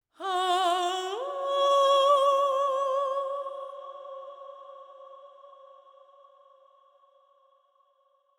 voz forte quinta justa

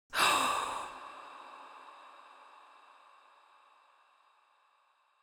respiração rápida

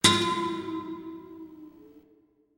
violão slide

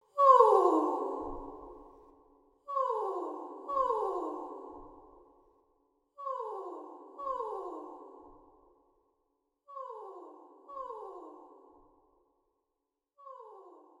riso descendente

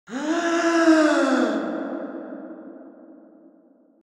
puxando ar

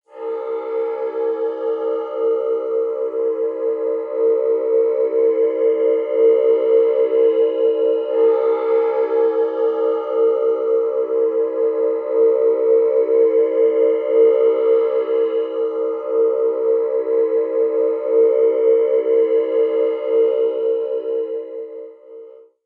concertina longa

